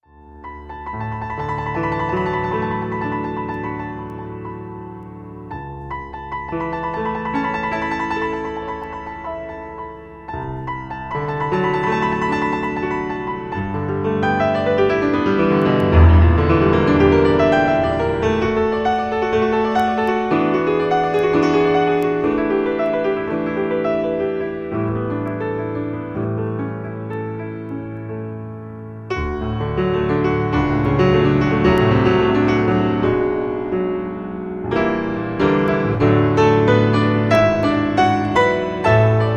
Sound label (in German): VaterUnser Instrumental